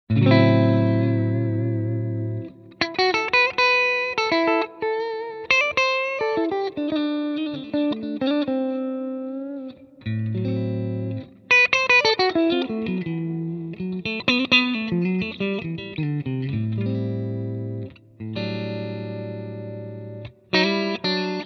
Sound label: Positive Grid Spark 40 clean